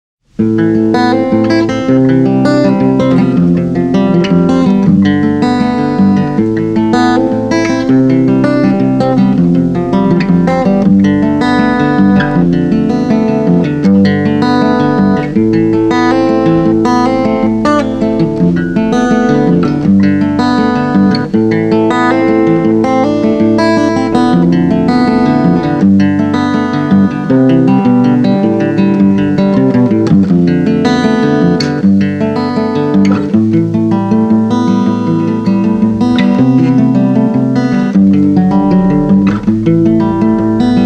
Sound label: guitar cover